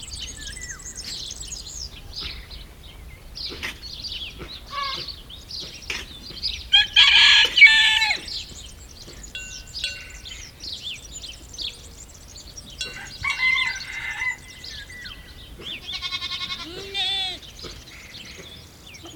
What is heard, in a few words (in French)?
Amb Camp Chevres, Countryside; French